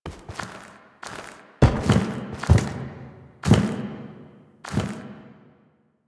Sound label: Beam Battle Get Up Foley; Warframe; The New War; Ballas Lotus Beam Battle